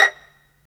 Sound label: STR PIZ VL, String Vlns Pizz; Roland - String Master Series